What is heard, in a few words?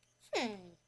hmmm